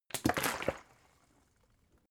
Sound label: bottle water big upend hard; gastronomie; berufe